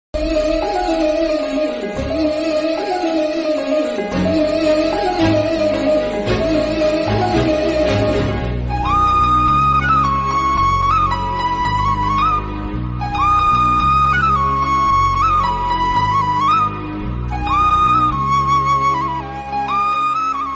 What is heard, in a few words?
bgm; sandakozhi